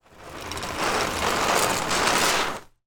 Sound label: sport pop up plastic framed goal small slide on hard surface; zapsplat